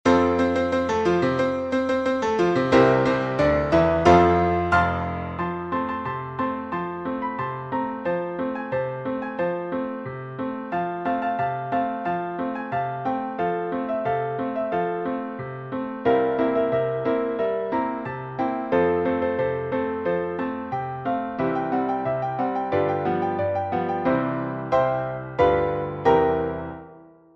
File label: piano, sheet music